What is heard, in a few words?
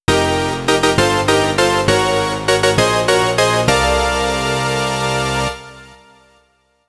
Jingle - Rank S; Sphere Knight